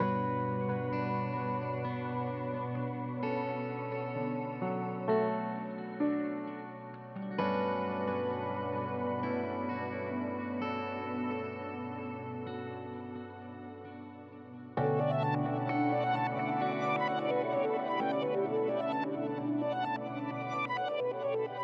KONTAKT THIRD PARTY SONG OPACITY ACCENT

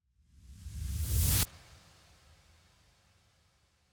EQ OFF; KONTAKT LIBRARY

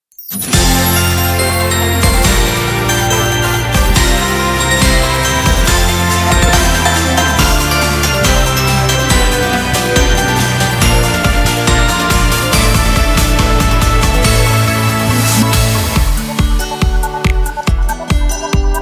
Idol pops

